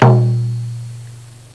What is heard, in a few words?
indian drum; Sound Effects for Multimedia - Volume 1